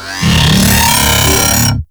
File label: Death; Warframe; Corpus; Jackal Redux